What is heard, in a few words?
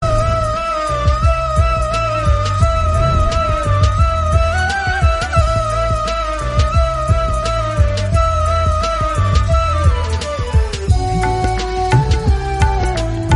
Flute Version; Scam 1992